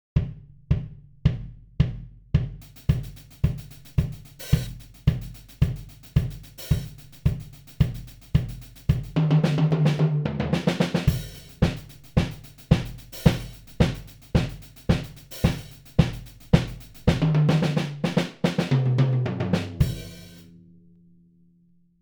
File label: Groove, drum-sets